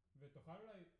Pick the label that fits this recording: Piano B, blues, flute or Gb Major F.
Piano B